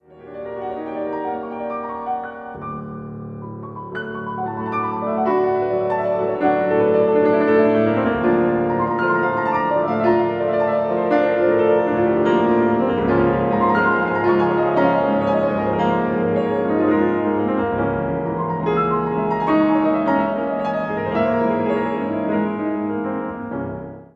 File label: Etude Tableau c-moll Allegro agitato